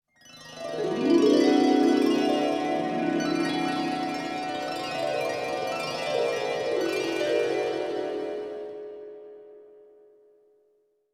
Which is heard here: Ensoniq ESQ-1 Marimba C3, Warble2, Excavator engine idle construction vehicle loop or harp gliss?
harp gliss